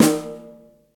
rich lows snare drum sample D# key, Royality free acoustic snare tuned to the D# note.